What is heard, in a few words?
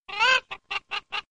cucco cluck